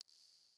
water drip light; Rust

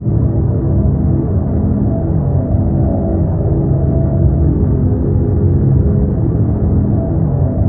ship engines; World Of Warships

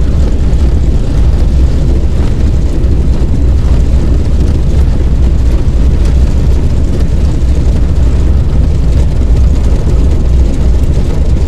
Old Plane Move; DCS

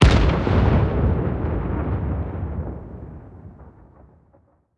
plane; World of Tanks

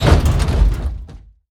Veh Hit, Damage; DCS

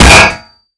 Projectile Sounds; Red Orchestra Ostfront 41-45

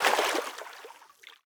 stag footstep water hard; Rust

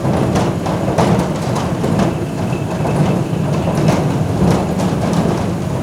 pumpjack rattle loop; Call of Duty Modern Warfare

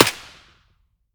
glock17 distant silenced; Escape from Tarkov

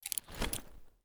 rgd holster; Escape from Tarkov

